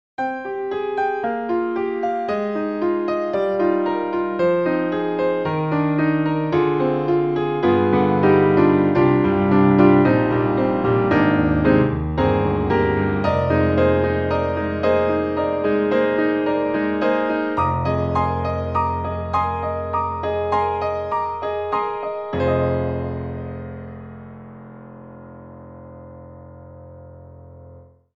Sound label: bright piano; EastWest; Quantum Leap Goliath